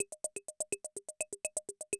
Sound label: CR78 125, drum loops